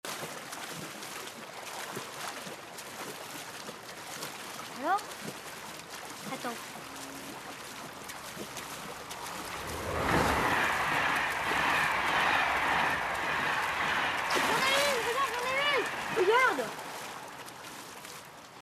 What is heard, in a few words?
Swing